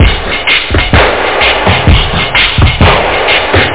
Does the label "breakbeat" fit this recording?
Yes